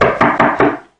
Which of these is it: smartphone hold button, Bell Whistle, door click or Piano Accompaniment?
door click